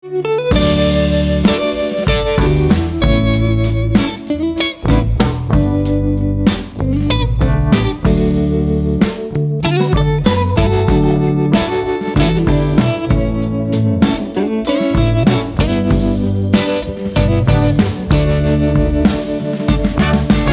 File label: Blues and Soul Guitars